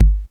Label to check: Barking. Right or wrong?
Wrong